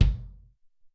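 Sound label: Deep Kick, Alesis SR-18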